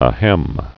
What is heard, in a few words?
Ahem